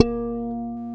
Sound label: GUI HRMFLA, Acoustic, Roland